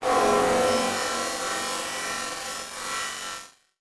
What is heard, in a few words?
Transition Distortion Power Source; Adobe; Production Element